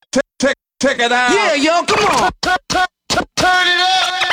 classic scratches check it out turn it up 2 bars 111bpm loop